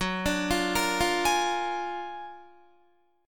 Gb Augmented Major 9th Guitar; Gb+M9 arpeggiated; JGuitar

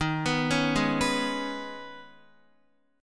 Eb 7th Suspended 4th Sharp 5th, Guitar; Eb7sus4#5; arpeggiated; JGuitar

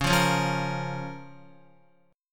Db 6th Db, down, Guitar; strummed

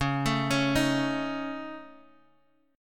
Db Suspended 2nd Sharp 5th Db, chord; arpeggiated; Dbsus2#5; JGuitar

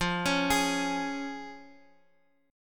F Minor Sharp 5th, Guitar Open D, chord

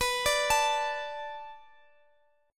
B Minor 7th B, Guitar, Half Step Down

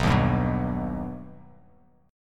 B Augmented B, chord; strummed; JGuitar

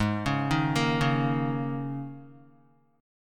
Ab Suspended 2nd Suspended 4th Ab down Guitar

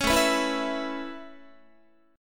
A-Major Add 9th Guitar, Aadd9 chord; Aadd9 strummed; JGuitar

